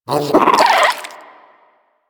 vocal idle, critters; Warframe; Hunting Critters; Nexifera

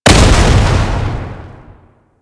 Tank Cannon Projectile Explosion; Enemies; Weapons; Warframe; Lasrian

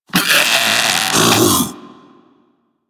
Infested Splitter Split Top Vocal Death; Warframe; Grey Strain

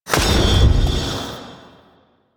Spear Impact; Warframe

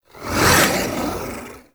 Archon Whip Ranged Attack Projectile; Enemies; Weapons; Warframe; The New War; Nira

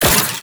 Scrap Pickup; Warframe; Missions; Zariman; Zariman Assassinate

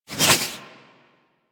Warfan Whoosh; Warframe; Weapons; Melee

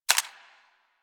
SMG Mech; Weapons; Warframe; Tenno; Sundial

